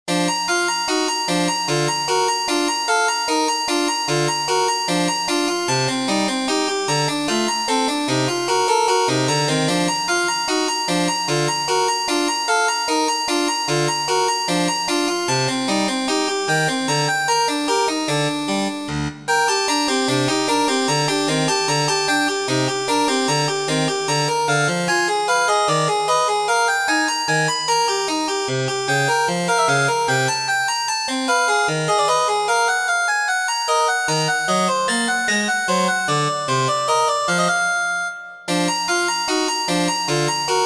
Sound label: tetris c flipped notes